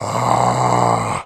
zombie alert

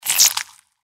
mantis hiss; npc

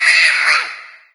idle, cherub; doom3

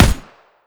smg1 single

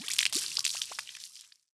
body bloodspout; flinder

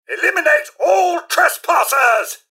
attack; nukarobots; mrhandy

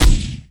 chaingun fire

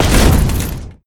jump

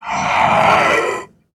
attack, zomb female; nmr

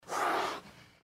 gecko claw atk vox; npc

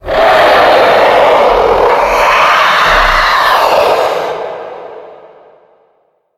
death

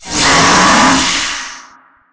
spider ouch strong

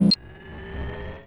turret startup

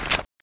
clip in, weapons; cso; turbulent7

